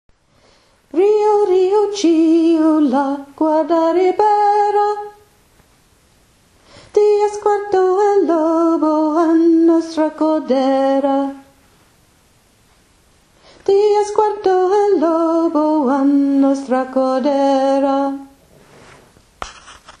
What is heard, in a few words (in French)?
voix soprane